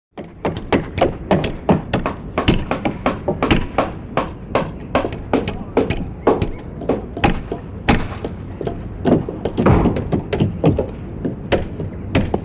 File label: Hammering Banging; hammers